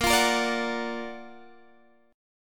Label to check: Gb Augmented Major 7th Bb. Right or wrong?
Right